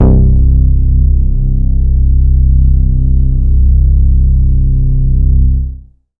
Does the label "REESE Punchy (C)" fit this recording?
Yes